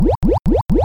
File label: Pixel Game Beeps